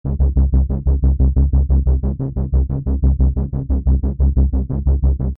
Bass; PatchFactory

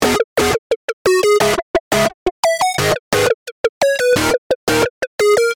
Lead; PatchFactory

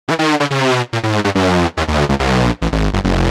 Lead; Viper Diva

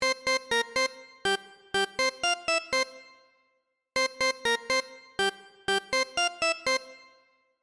Lead; PatchFactory

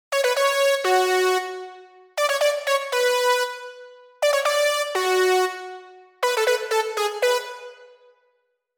Lead; PatchFactory

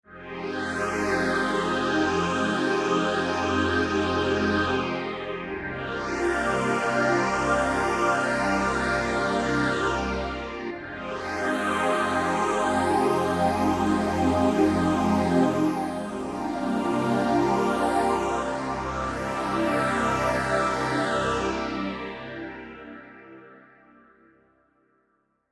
Pad; In Heaven

